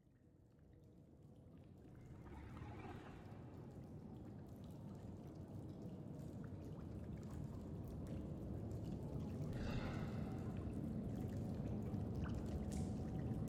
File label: amb cave; ambiance; environment track; caves